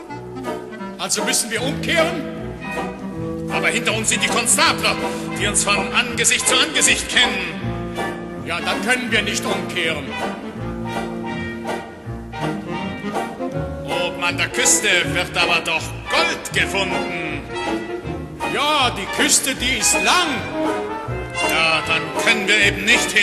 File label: opening jazz trumpets; Weill; Mahagonny